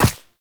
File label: Walk Ice; footsteps; Warframe; Operator